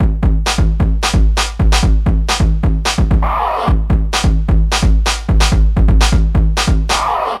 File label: funk slide drum loop